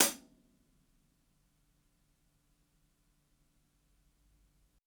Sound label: ROOMY HH, HI HATS; Basement drums vol 2